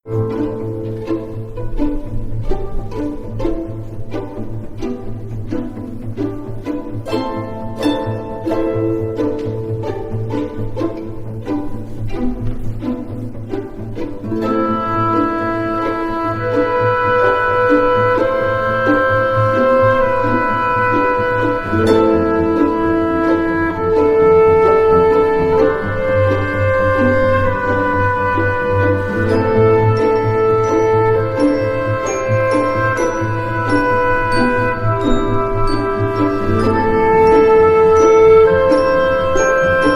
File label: questioning